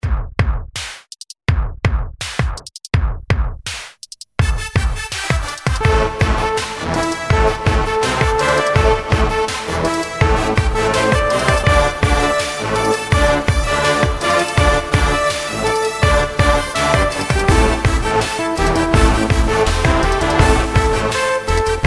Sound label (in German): Marsch